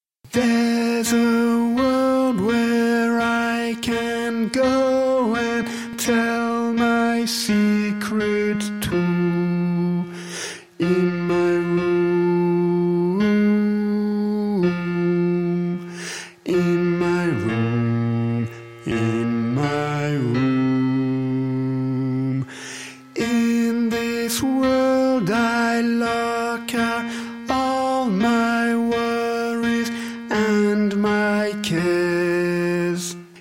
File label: bass; pop